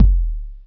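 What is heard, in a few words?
bass drum, drum machines; 300 Drum Machines; E-MU Orbit-3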